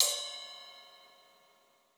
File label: CLUB RIDE; 3D Sound; 3D Drums